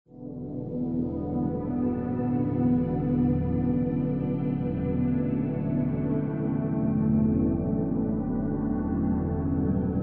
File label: Amb Sound Scape Mysterious chords, Sweeping FX, Synth melodies; Outer Space; Ambient SoundScapes